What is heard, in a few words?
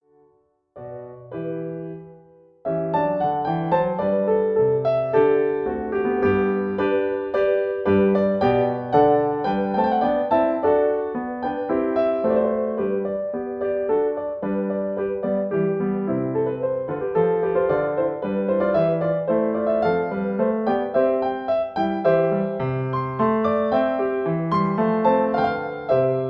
Accompaniment C; Wide Spread his name